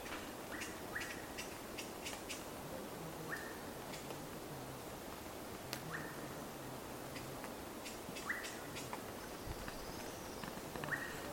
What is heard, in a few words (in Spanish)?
fueguero; Tiê-do-mato-grosso (Habia rubica); El Habia corresponde a la vocalización de contacto